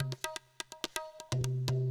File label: PERCLOOP ETHNO 125